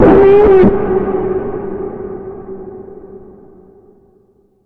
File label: Bend, G